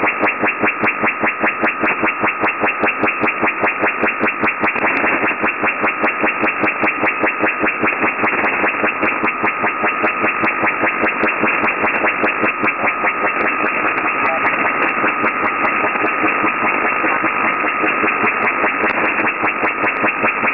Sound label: ocean; iarums